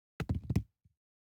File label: DICE on Neoprene, Throw and Roll, Foam, One Die; Samples; ArticulatedSounds; DICE Sfx Party (Extended)